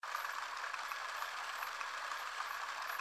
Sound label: Spin; Steam Miners